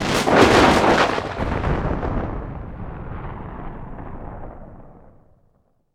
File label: Thunder